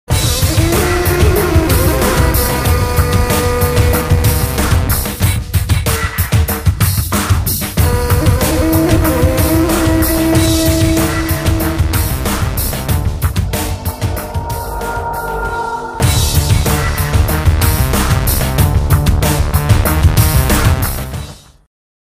Villain Bgm; 7aum Arivu; Villain Dong Lee

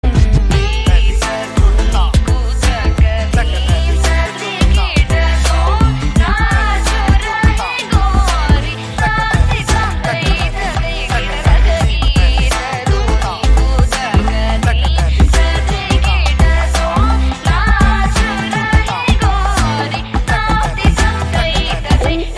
BGM Ringtone

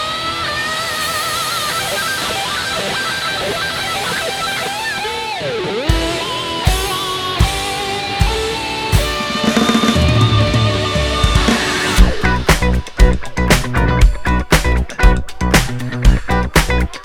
Instrumental

